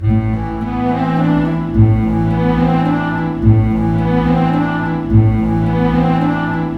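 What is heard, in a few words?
108 start, orchestra; East West; Scoring Tools; Start Story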